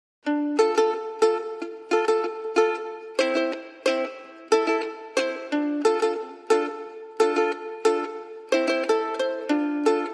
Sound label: Drunken Sailor, Ukulele